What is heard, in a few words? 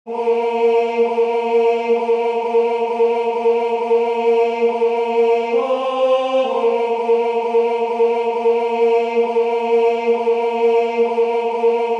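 Tenor; Durufle; Ubi Caritas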